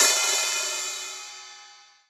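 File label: open hi-hat; eddyflux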